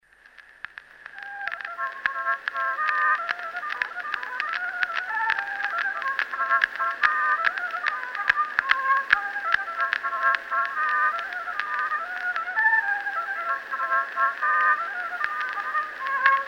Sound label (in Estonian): Polka, viiul folk; Kuusalu khk; Eduard Aaman